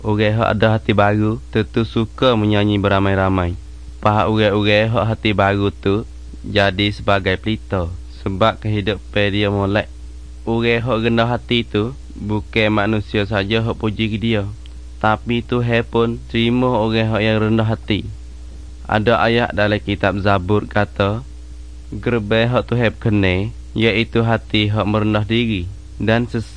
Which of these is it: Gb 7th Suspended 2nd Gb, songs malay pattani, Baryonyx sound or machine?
songs malay pattani